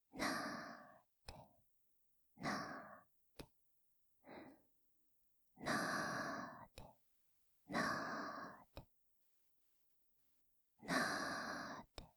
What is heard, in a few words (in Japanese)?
なでなでループ; お姉さんの爆乳パイズリに溺れて抜け出せなくなるお話～おねショタから貢ぎマゾまで～; 妹すたー; 安眠用なでなでむにゅむにゅループ